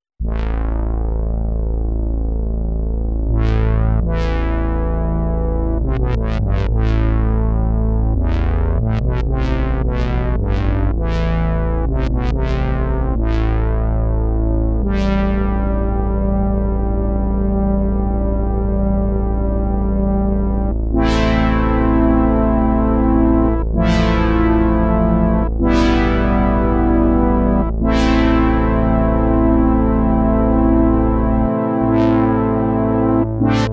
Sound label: Synth Brass; TAL-Bassline-101